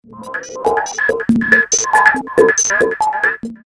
Noisy, Ringtones; Siemens M75

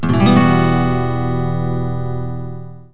Finger Picking, Ringtones; Siemens S68

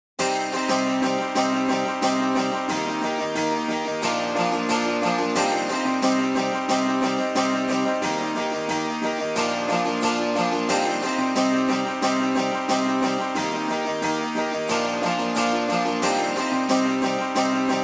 Spanish Sunrise, ringtone; Benq-Siemens EF81

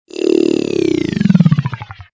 Slow Release, ringtones; Siemens M75

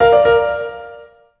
Slider Open Melody; Siemens SG75

